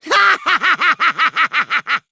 maniac laugh; kokichi

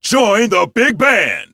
bigband

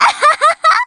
laugh

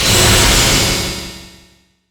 slash

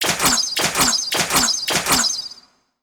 bow multi attack; tressa

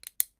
glasses; ema

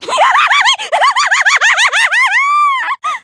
laugh

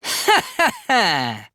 hehehe; kiibo

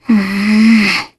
groan

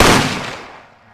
gunshot; trilogy

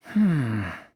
hmmm; shuichi

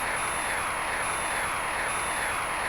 noise hack

